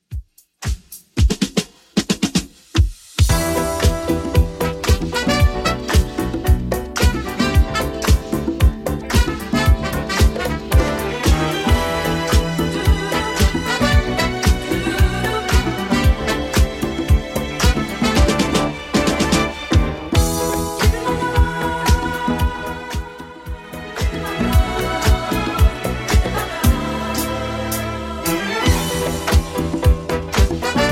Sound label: 80s Redrum 113bpm